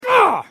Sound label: pain